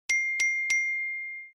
Triple Ping

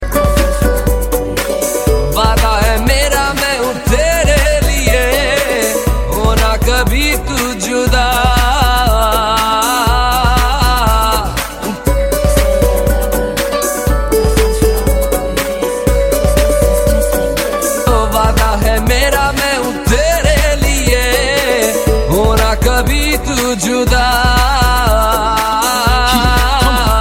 Hip Hop mix

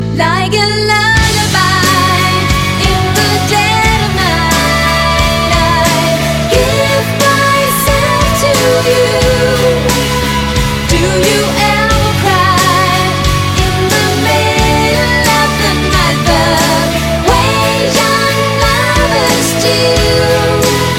Lullaby

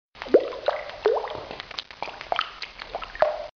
Rain Drops Sms

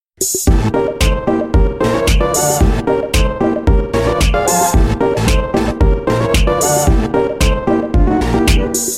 Spooky

